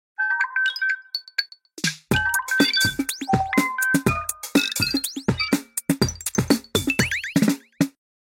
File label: Google Pixel 6 Mashup, Klingelton